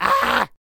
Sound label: Stoner Attack Vocals